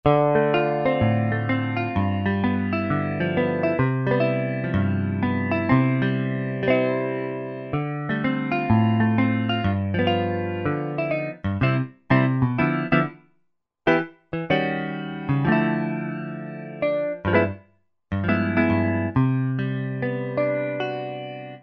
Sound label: Clean Tele; Roland SR-JV80-09 Session